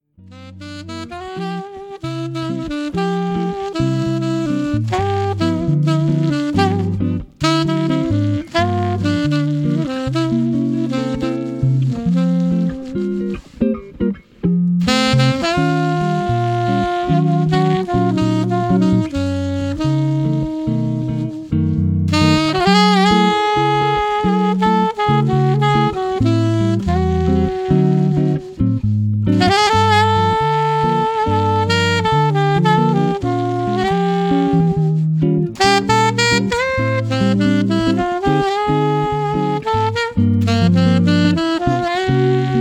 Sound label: sax guitar